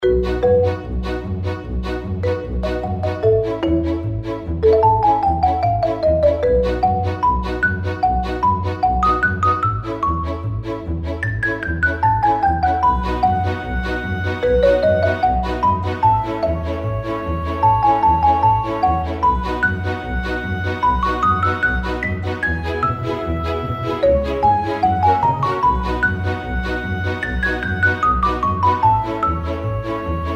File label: reelspin bg; Joker Slot; slot game